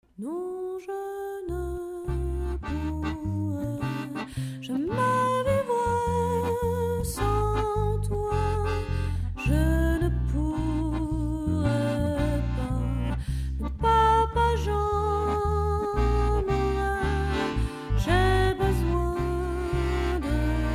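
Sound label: French Chanteuse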